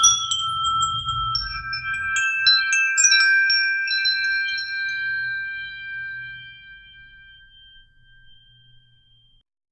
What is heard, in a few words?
soft harmonic chimes; musical